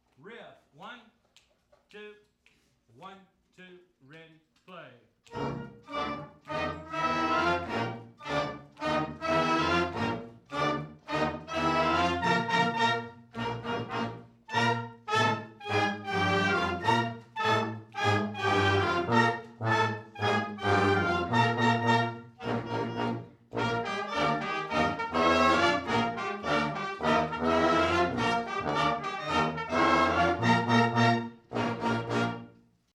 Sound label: riff